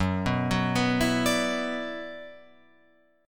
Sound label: Gb-7th Suspended 4th Sharp 5th-Gb-6,6,6,6,7,9-8-down-Guitar-Open F; arpeggiated; JGuitar